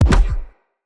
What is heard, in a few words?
Bow Shot Charged Punch; Weapons; Player; DendritBow